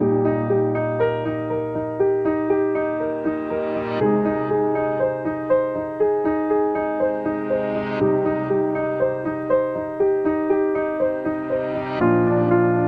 Medical Background; business background

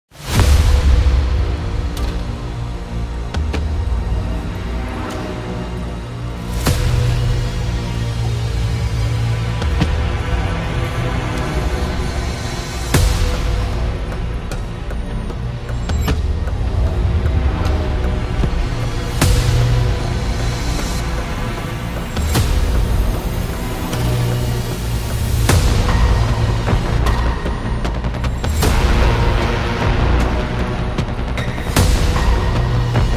Horror Escape Room

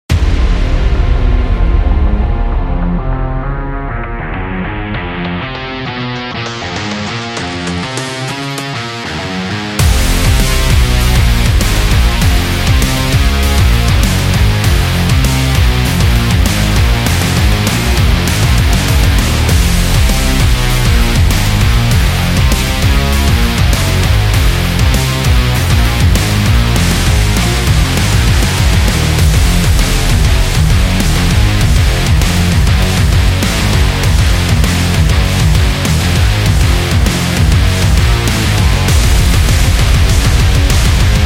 Heavy Rock

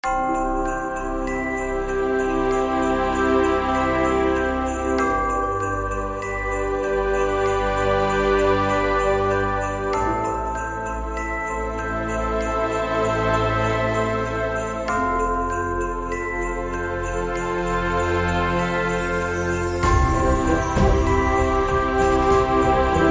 Christmas Inspiration

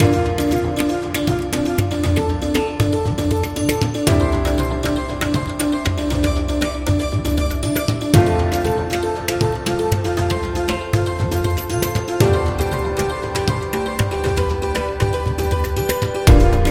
India Travel Upbeat Music

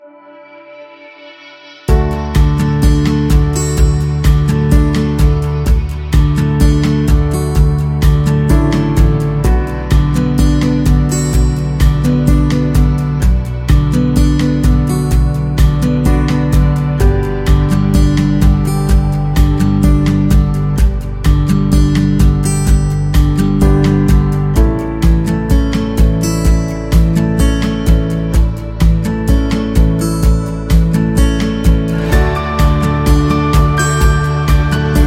Uplifting Guitar

